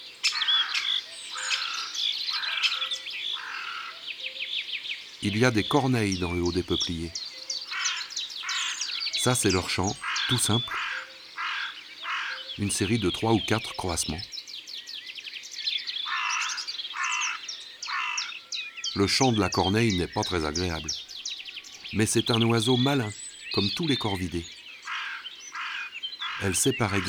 Corneille noire, ABC des chants d'oiseaux

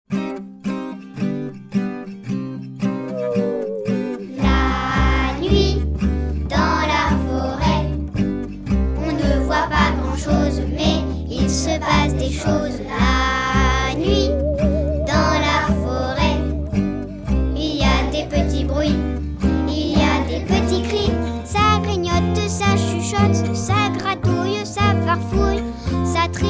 Chanson La nuit dans la foret

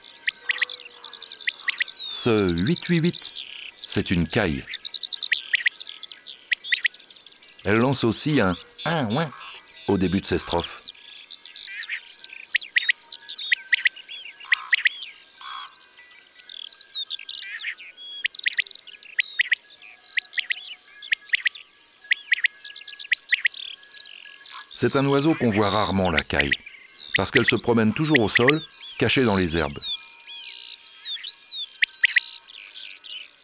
Caille des bles; ABC de la nature

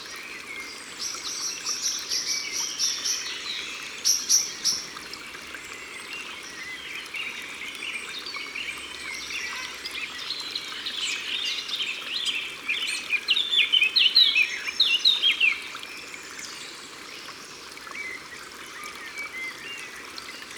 Merle noir cris d'alarme Au bord de l'eau

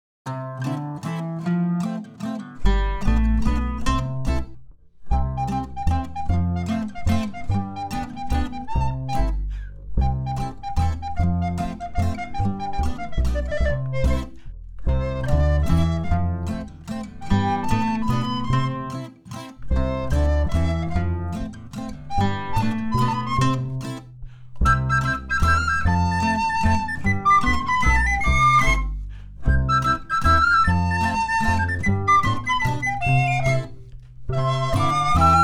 INSTRU

